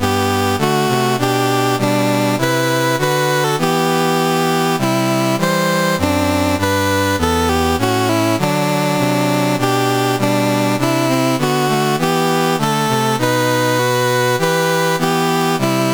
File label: New Zealand, National Anthems, CSG 8580